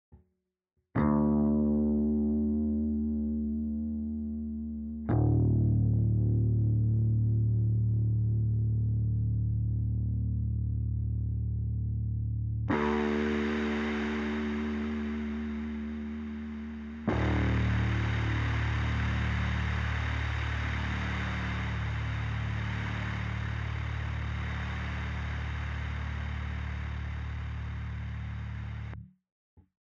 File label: bass